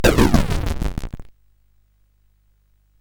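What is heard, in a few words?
Nebulus, MOS 8580 SID chip music, games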